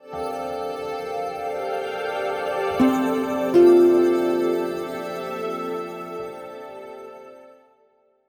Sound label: Play Stinger; Stalladris